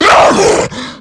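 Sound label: infection attack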